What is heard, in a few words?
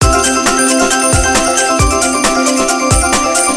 countup; Fantastico 7; slot game